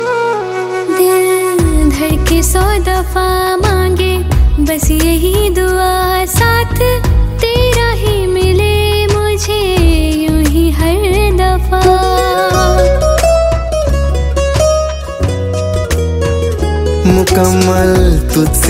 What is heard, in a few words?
hindi ringtone, bollywood